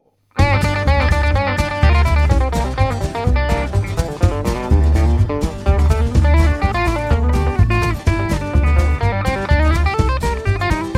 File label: Country solo, guitar